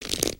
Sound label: Pet Dragon Scratch; creatures pets